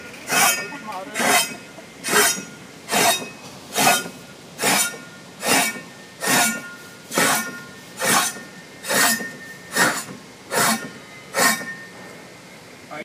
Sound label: parchment singing